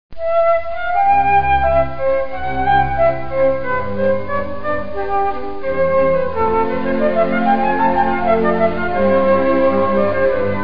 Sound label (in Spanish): flauta; FLAUTA MÁGICA